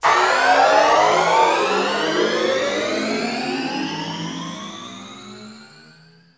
OHSO SCARY, Trance Fusion; ILIO

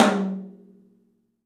OPEN TOM; 3D Sound; 3D Drums

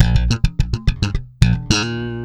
Bb, Bass, 96 BPM; Ultra Freakin' Bass; Sampleheads

